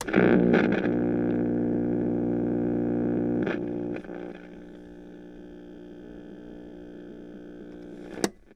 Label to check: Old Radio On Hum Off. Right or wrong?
Right